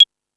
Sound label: Percussion, E-MU Pro-Cussion